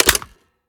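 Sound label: sniper reload empty mag in; gmod; iw8; xmike109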